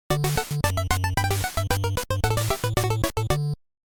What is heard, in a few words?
game over; duck hunt